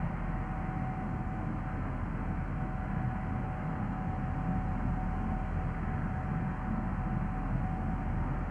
cargo ambient; CrossCode

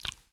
stick; World Of Goo

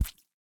slime splash; CrossCode; battle

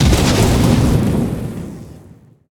heavy thunder; CrossCode; battle

